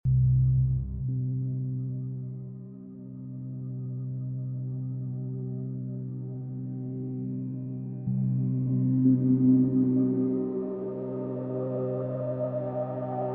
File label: Rapid One Synth Loop; Suspense